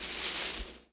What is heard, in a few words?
Mandragora Stand